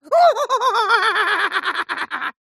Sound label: laugh silly exagerated; human; zapsplat